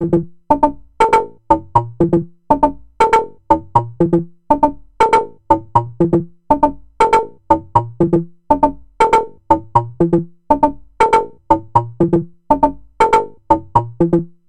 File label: Dreadbox Drips V2